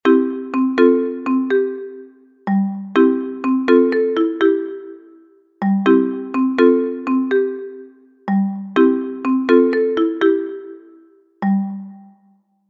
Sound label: piano